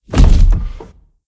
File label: Foot Step; blue archive